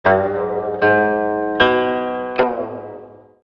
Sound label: Qin